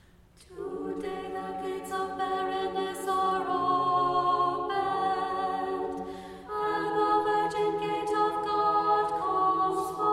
Tone 6 Common Chant Sticheron Example G minor Alto; chanting